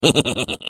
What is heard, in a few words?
laugh, ru; Defective Turret; sp sabotage factory defect